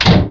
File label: Laser A Fire